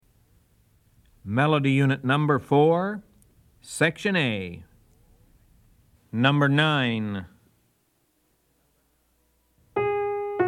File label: melody, ear training recordings